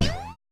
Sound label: zap, Roland MC-909